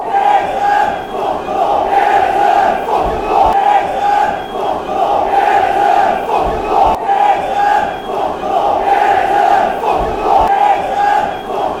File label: get em sunderland